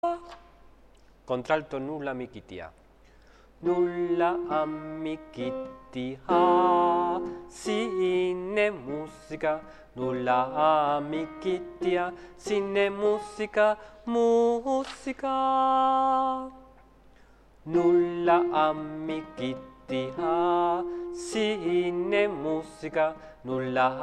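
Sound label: alto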